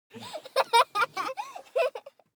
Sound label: happy child laughing